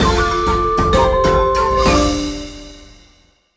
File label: win large; netent